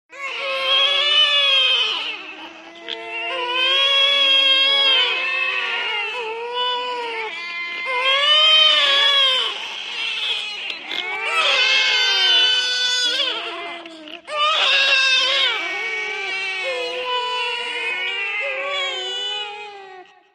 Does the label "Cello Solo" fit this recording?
No